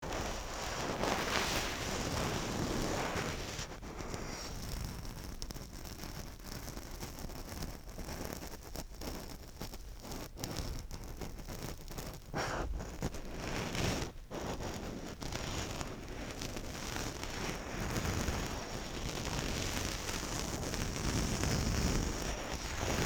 Uldkappe, contact sounds

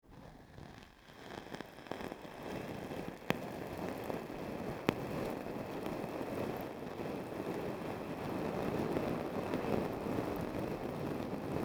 Uldkappe, svage lyde, contact sounds

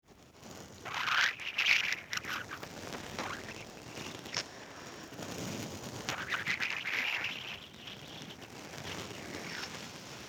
Uldkappe med knaplyde, contact sounds

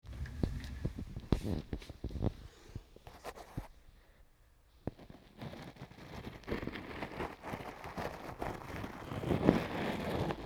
Læderpung, lidt længere, contact sounds

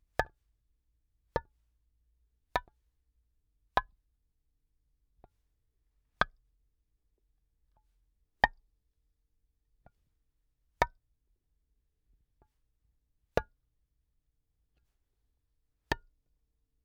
Reparationsæske II, contact sounds

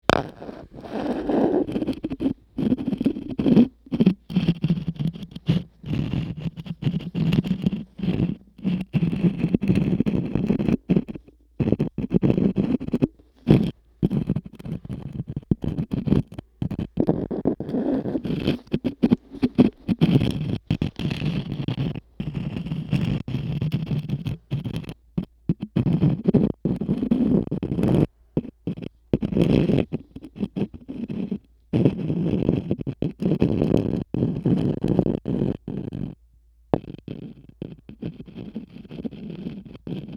Sten, contact sounds

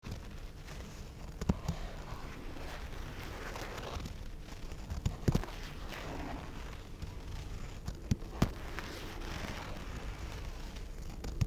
Lang knipling, ingen EQ

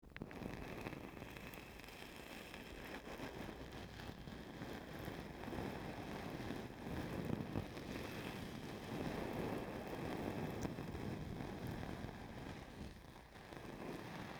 Uldkappe, svage kontinuerte lyde

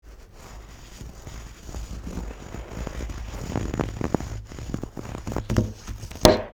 Lysedug, crescendo

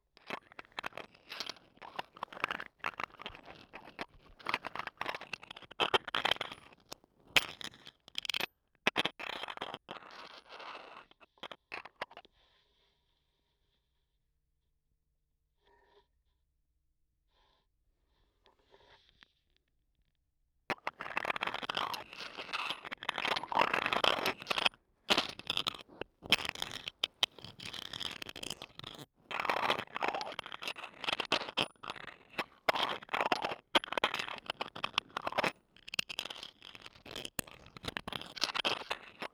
Knapper, contact sounds

